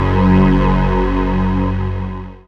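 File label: synthesizer Dance; Best Service Gigapack 1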